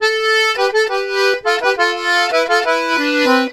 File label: Complete Accordions; USB Soundscan vol.40; AKAI